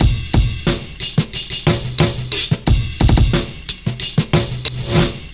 Hard Programmed Drum

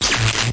Laser Fx

